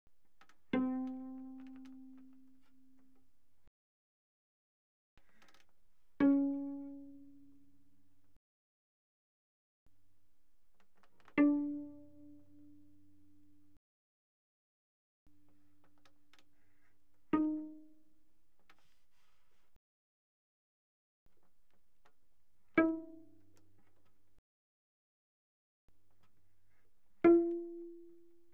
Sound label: Cello pizz pp sul D C4 A4; Strings